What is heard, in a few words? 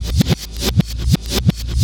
drum loops; bb drum loops